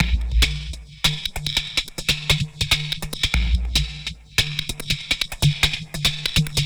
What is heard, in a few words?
Unstable Groove; Extra Tweak Grooves